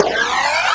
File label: Sweeps Short